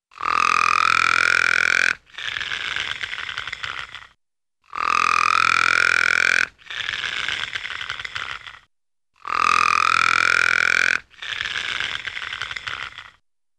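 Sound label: Funny Man Snoring with long Medium-high Pitched; Hollywood Edge; Cartoon Trax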